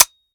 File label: empty; svencoop; ins2; grease